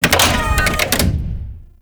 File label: Thumper Bot Leg Damaged; Warframe; Grineer